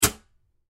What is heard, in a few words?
Fuse Box Close Door, Fuse Box Close Door Panel; Basement; Household